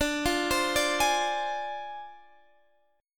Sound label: D Minor 7th Flat 5th Guitar Standard; arpeggiated; capo 9